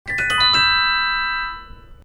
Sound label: celesta sms